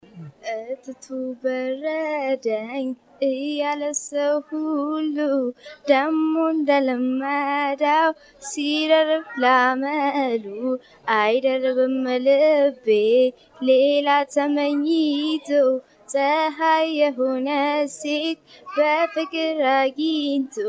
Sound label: singing